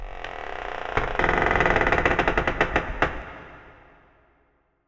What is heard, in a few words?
Spook